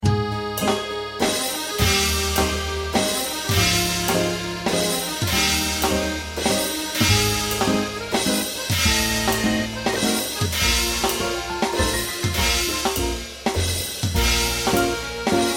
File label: 3:4 Waltz